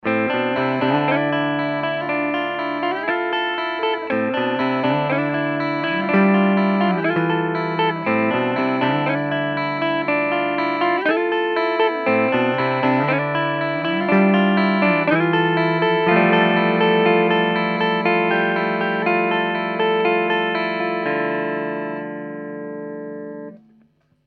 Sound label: Ibanez neck